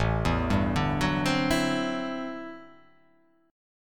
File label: A Minor 13th A, Chord; Am13 arpeggiated; x,0,2,0,2,1,2,1; JGuitar